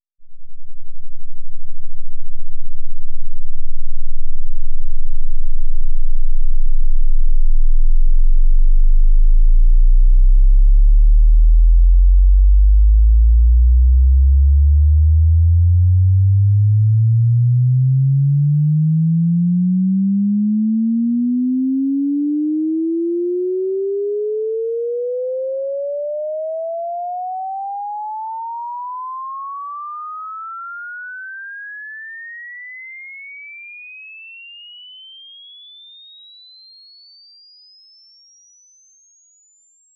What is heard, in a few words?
Short glide tone; Ayre Acoustics, Inc; Ayre System Enhancement Disc